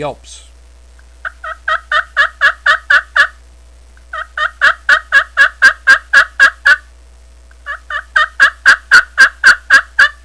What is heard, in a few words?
yelps, turkey hunting; primos battey